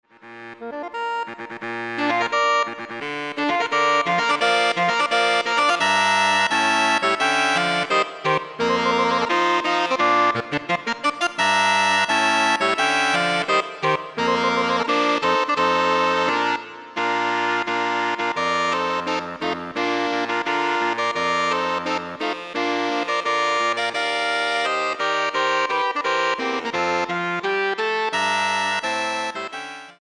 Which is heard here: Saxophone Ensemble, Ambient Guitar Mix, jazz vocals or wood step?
Saxophone Ensemble